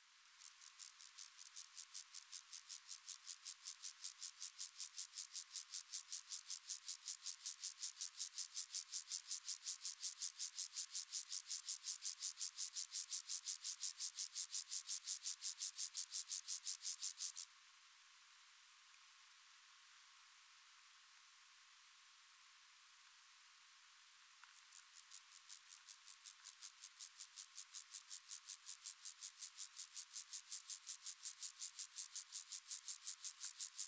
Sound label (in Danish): steppegræshoppe